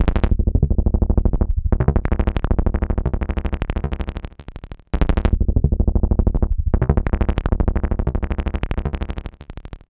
BAZE, BASSLINES; Masterbits - Tekkno Trax

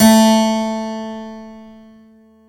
KEY HRPCR, Roland; Roland - LA Composer vol. 1

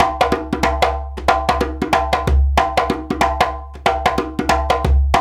Lamba, African Contemporary; Spectrasonics; Supreme Beats

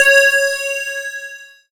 C#, BELL GONG, Synclav FM; Big Fish Audio

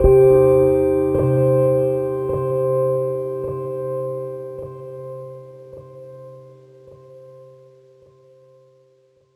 fx dorian reduced C; One Shot FX; Sample Magic; Transmission-X

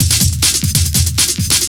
Beat, Industrial

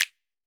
F. SNAP, finger pops

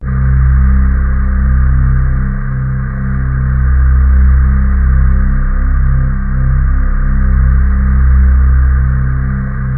VOX PIAN; MORPHEUS

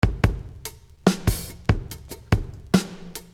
Beat, Hip-Hop Street Beats

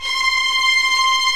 TREM VIOLINS

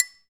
triangle, percussion

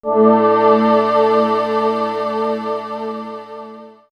Swirls Harmonic, pads; X-Static Goldmine

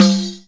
percussion, Ethnic Perc

